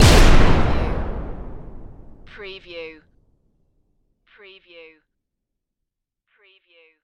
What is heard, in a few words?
Explosion Heavy; Sound Effects Bible